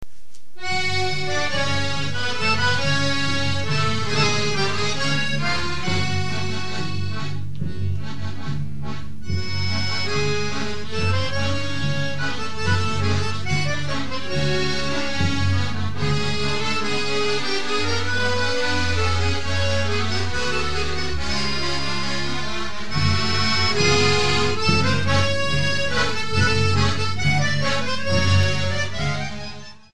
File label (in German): Türkischer Parademarsch